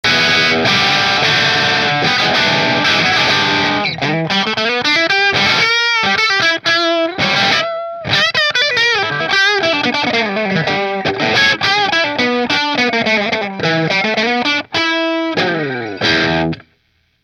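Nola Voodoo humbucker bridge split marshall, guitars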